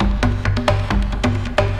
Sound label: MOVE LOOP, Techno Trance; E-MU Formula 4000 Series Vol. 2